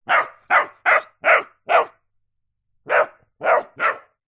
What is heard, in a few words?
animals dog puppy several weeks old barking ridgeback cross bullmastiff